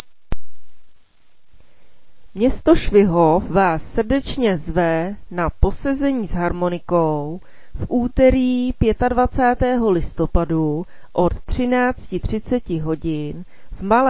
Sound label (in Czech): harmoniky; Posezení s harmonikou 25. listopadu 2025